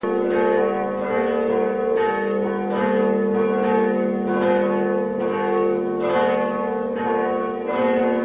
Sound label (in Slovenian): zvonovi